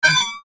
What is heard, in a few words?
Rollover Blurb Warble Chorus; Bright High Pitch Squeak Whoosh; Multimedia; Blastwave